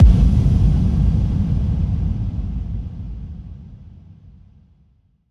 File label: Kick FX